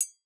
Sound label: Metal Glass; Sample Growing Stream 002; Ear Candy